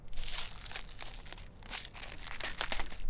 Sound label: Shaking Box of Screws; BB Retail Therapy Sample Pack